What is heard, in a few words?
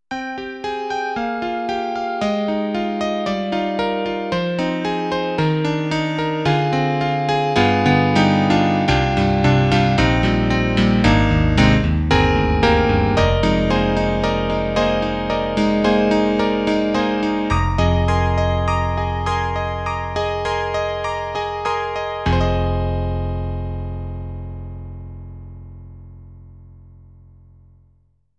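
SD-90 Contemporary Electric Grand Piano; EDIROL; E.Grand Pf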